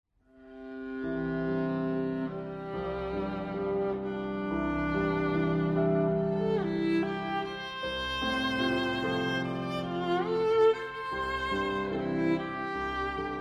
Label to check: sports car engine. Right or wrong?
Wrong